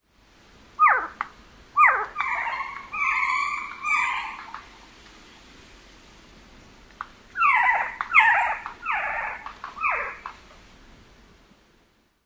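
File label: Eastern Screech-Owl squeals and bill snaps interaction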